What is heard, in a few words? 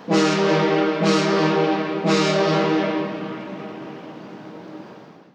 BC Ferry